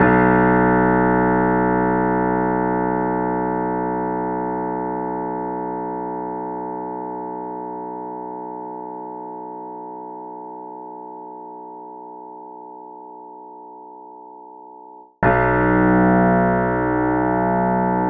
piano beating

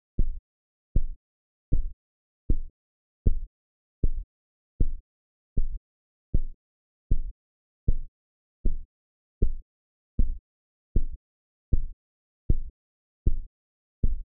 pulses

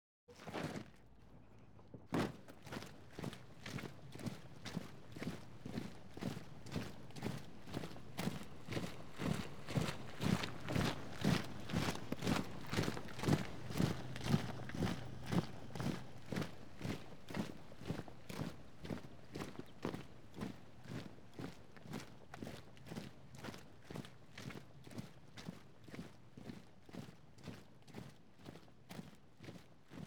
march Right To Left

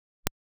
pink imp

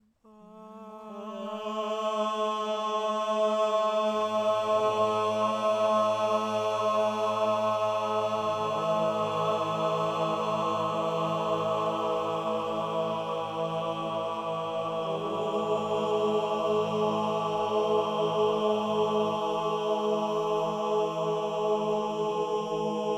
Mono aaaooo Carrier

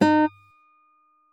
Flageolet Fourth; flageolets

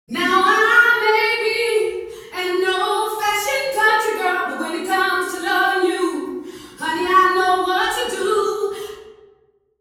singing

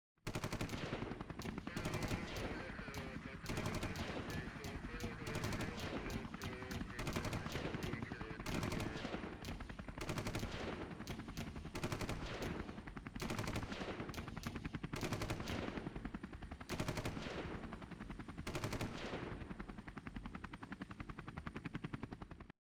Pan three Static Sources

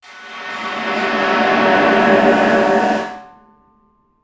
drum abstract reverb

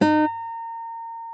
Flageolet Third; flageolets

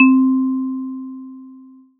marimba

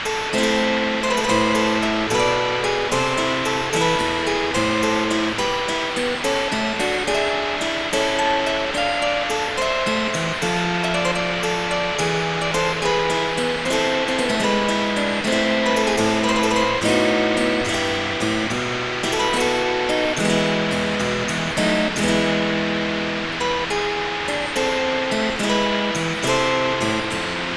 harp hiss; antiquing

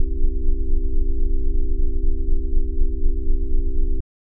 c major chord bass harmonic imperfection, hammond

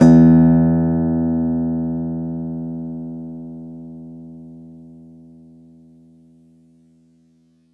synth sound

